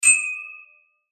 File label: chime filter, Chime Filter Dark Metallic Scrape; Production Element Imaging Element Accent Transition; Multimedia; Chimes; Blastwave